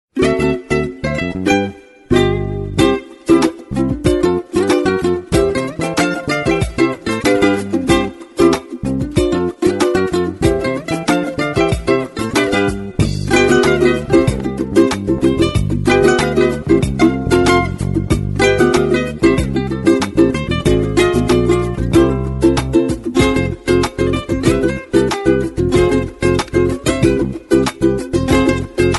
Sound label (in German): Gitarre